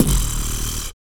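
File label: vox percussion, Vocal Planet Jazz FX; Spectrasonics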